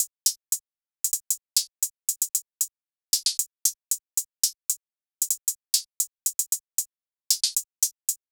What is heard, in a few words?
Hihat Loop 115 BPM, RnB; Hihat Loops; Divine; Cymatics; GHOST (Beta Pack)